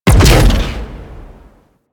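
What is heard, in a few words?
Space Fighter Projectile Explosion; Space Fighter Gox Agent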